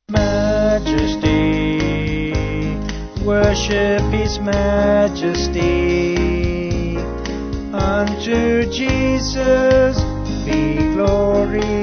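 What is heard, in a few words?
Vocals Band, Music Backing Files for Churches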